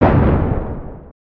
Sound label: Siege Tower Distant; destructibles